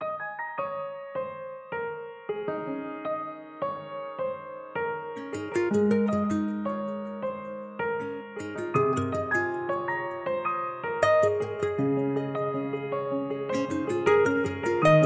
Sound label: Env Life Is Good Alarm; LG K92 5G; ringtones